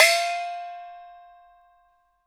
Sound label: XIAORUO, PERCS KIT; INIS - Opium